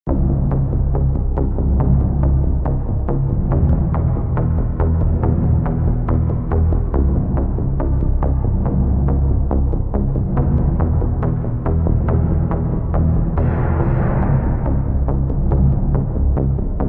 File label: Ship Theme; Mass Effect Galaxy